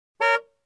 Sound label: Car Honking once short